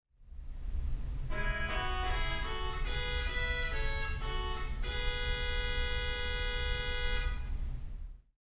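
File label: Orchestral Oboe, theatre organ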